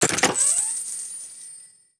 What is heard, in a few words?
Chest Coins Opening